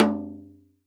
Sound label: CHANGGO, KOREAN PERCUSSION; AKAI S6000 CD-ROM - Volume 5